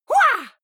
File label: female fight shout attack; vocals; comedy-cartoon; soundpool